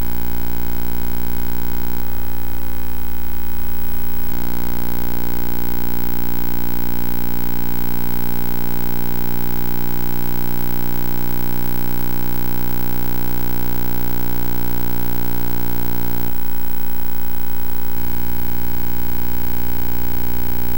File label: Unknown 229,250 MHz